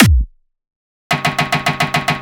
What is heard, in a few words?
Dholki Beat